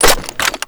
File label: reload clip in, foley; Call of Duty Modern Warfare